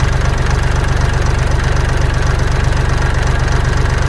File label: crusher idle